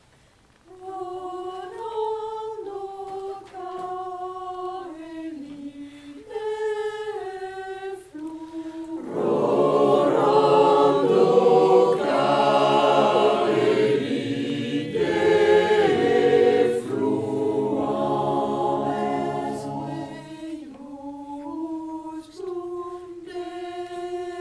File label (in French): rorando caeli les basses; pas trop vite